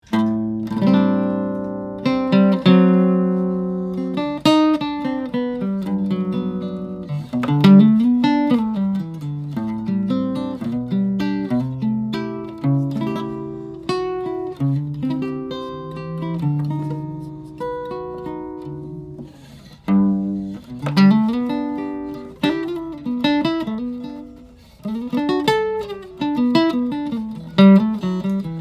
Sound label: Gibson L-5, guitars; 1946-1947; gibson l-12; maple; spruce; archtop guitars; acoustic